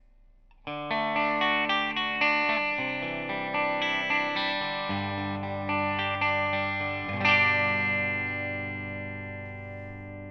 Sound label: eq midi